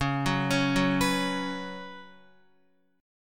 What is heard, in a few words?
Db 7th Suspended 4th Guitar; arpeggiated; chordshape; JGuitar